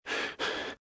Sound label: Breathe Fast Heavy; RadwinIsland